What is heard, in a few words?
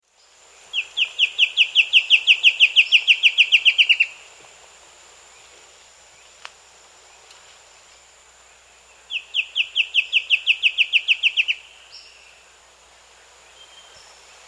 Short-Billed Antwren, S. America